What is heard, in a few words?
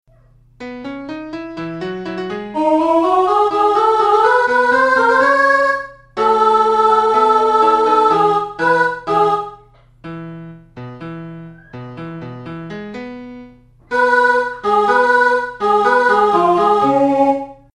mozart mezzo; lyon